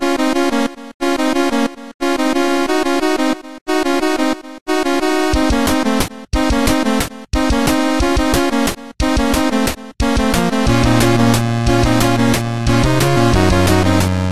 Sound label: Church Music; CITD Alexandria